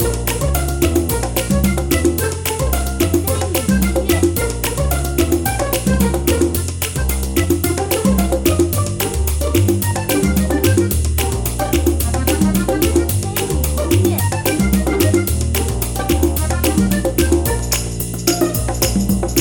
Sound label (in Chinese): 游戏内背景音乐